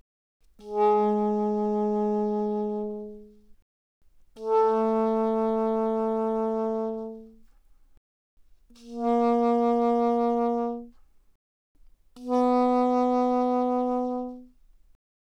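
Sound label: Soprano Sax Vibrato pp Ab3 B3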